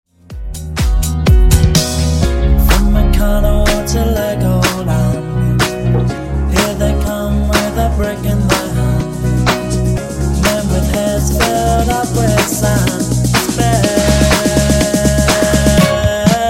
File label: Funk Nu Disko 124 Bpm